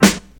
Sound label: old school sharp hip hop snare sample g key; snare drum samples